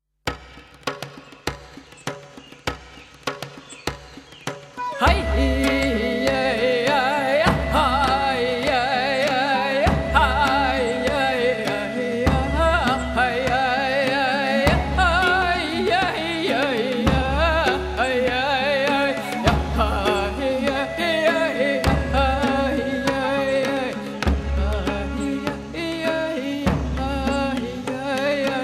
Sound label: Chant Sample